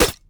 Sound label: vs flesh; LoL; masteryi